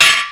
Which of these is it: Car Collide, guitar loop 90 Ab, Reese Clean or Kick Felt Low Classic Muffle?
Car Collide